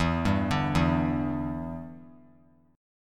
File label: E Minor 7th, down; arpeggiated